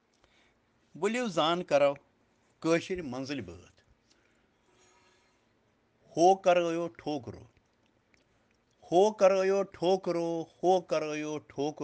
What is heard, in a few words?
Children Rhyms in Kashmiri